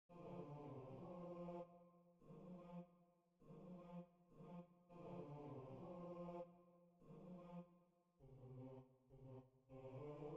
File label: Bass